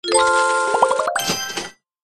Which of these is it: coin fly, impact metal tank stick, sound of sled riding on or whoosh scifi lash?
coin fly